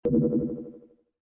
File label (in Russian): pomehi v kosmicheskom kompjutere na korable